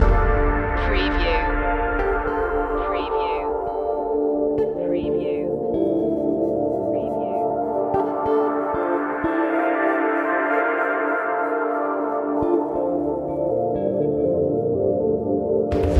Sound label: interference loop